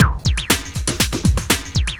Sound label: housefunk, beats